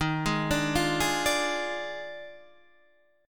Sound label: D sharp Major 7th Suspended 2nd Suspended 4th, Guitar, Open D